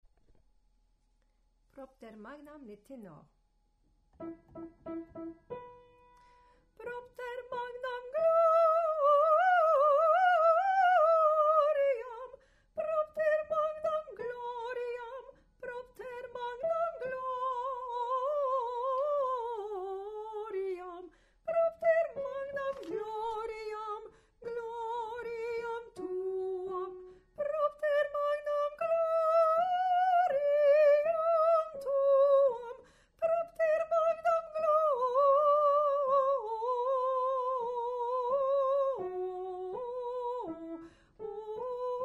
Tenor; Vivaldi - Gloria - Propter Magnam

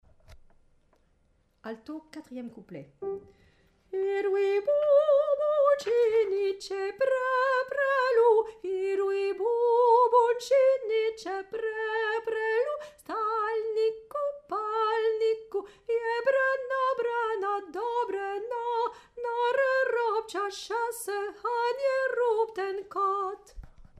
Alto

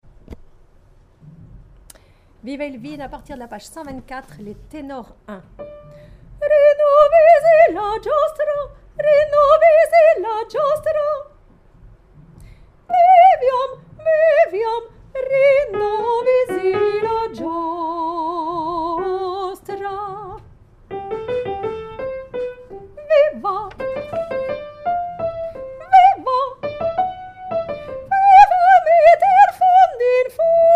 Tenor; vino

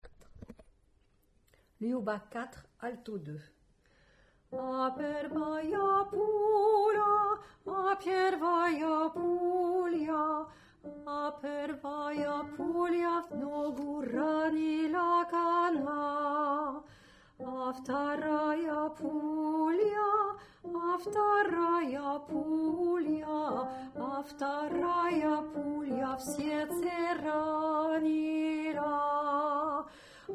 Alto

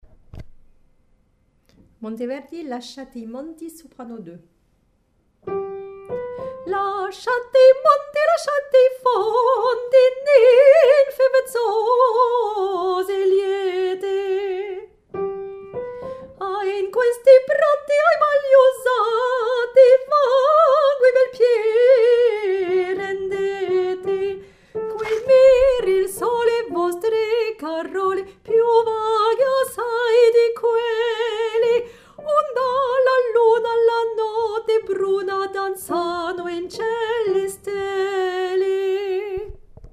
Soprano

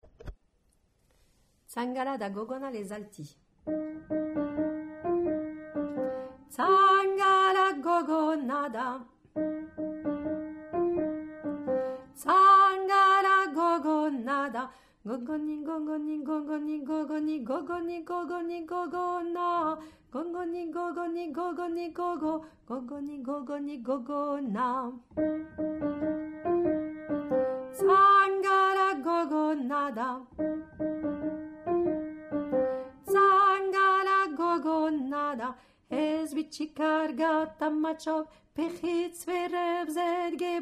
Alto; Tsangala da Gogona

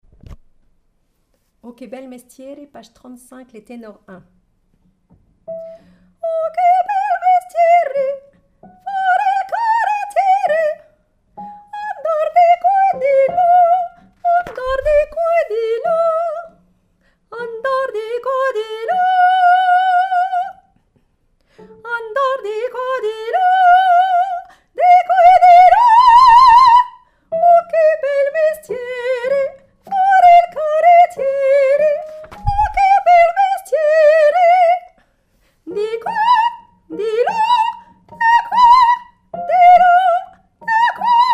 Tenor

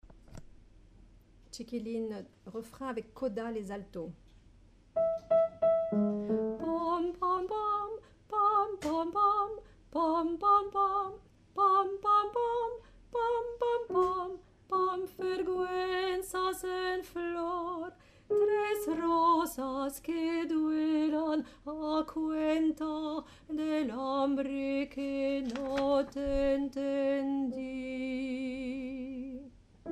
Alto

